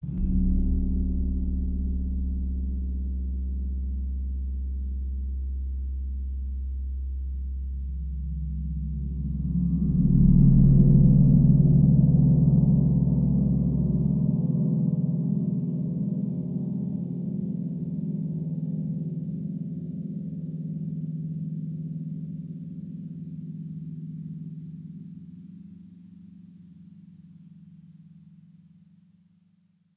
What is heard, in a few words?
Sinister Gong; Age of Conan Hyborian Adventures